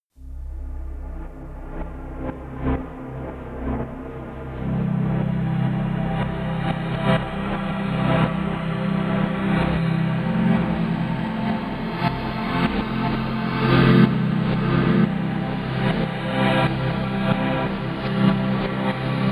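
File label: glitchy trance, pads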